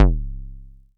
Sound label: kicks; FutureRetro FR777